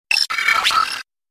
glitch

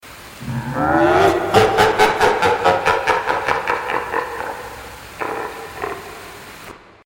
monster laugh

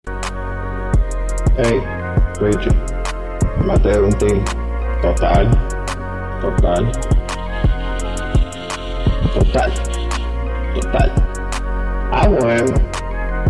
85 bpm

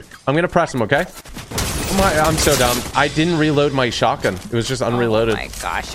shotgun unloaded

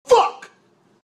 duck

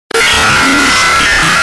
jumpscare; fnakazgrin a childs past

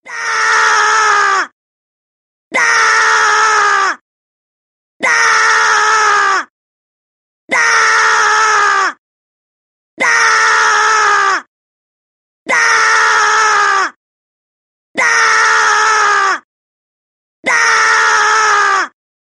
bwaaaaaah; the raving rabbids

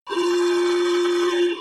pigs aaugh

